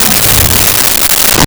Radio Hit Descent

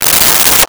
Unzip Duffle Bag, Foley

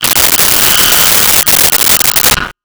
Radio Hit Digital Bull Horn; Transitional Elements; 1001 Sound Effects

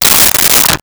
Cordless Electric Drill Tighten; Tools

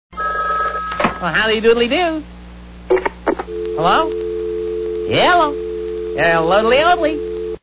flanfone

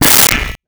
Pot Lid, Kitchen

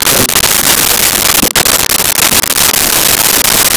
Tune AM Radio; Communications

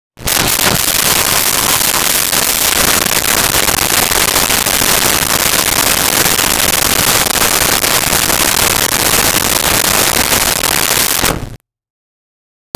Ignite Gas Stove; Appliances

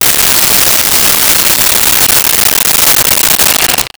Roto Saw; Tools; 1001 Sound Effects

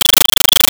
Arcade Chirp; 1001 Sound Effects

